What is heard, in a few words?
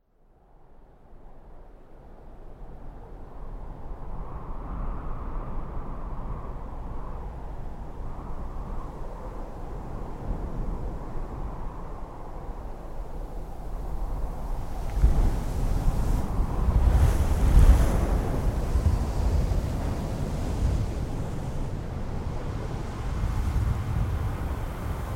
Intro Ambient; Graveyard; Journey